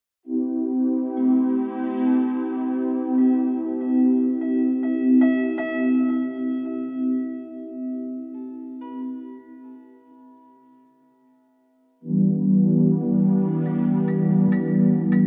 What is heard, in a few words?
mysterious voice duduk; 432 hz; Elevation as in a dream